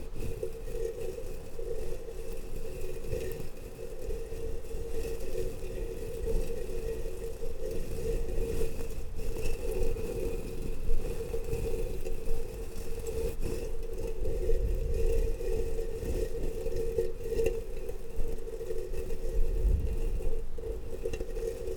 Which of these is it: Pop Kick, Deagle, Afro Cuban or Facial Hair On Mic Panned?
Facial Hair On Mic Panned